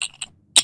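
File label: casino ambience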